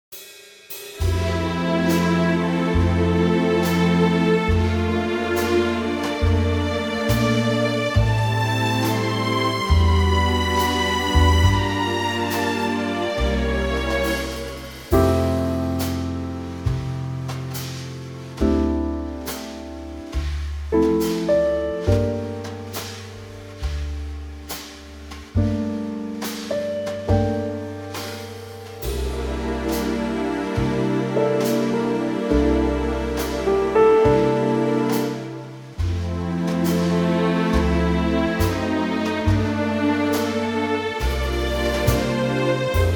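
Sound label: F orchestral; key - F - vocal range - C to D; gorgeous orchestral arrangement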